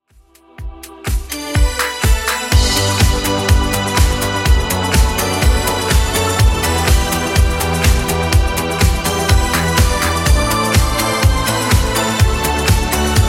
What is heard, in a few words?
80s Redrum 124bpm